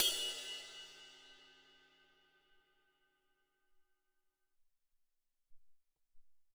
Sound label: Amb Ride, Alesis SR-18